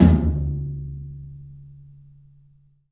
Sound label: TOM, Low Toms, 3D Percussives; E-MU Producer Series Vol. 5 – 3-D Audio Collection